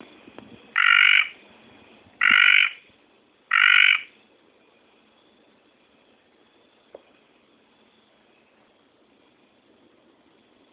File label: litoria rubella, frogging; Naked Treefrog